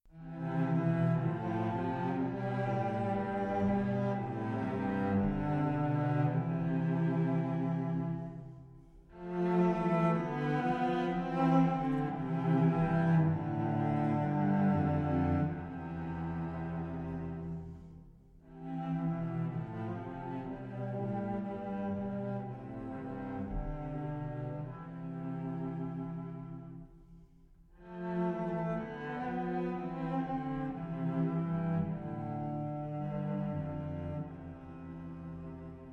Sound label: Chorale Cellos